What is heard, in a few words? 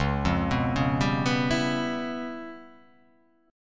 C sharp 7th Sharp 9th Flat 5th, chord